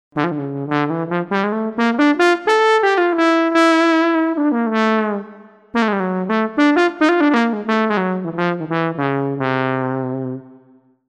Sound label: Big Bone; TURBO VL